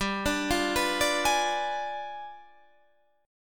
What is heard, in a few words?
G 7th Flat 9th, Guitar, Standard